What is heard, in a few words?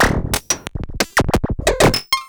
FM Bleep Loop; Polyend; Stazma & Concrete Collage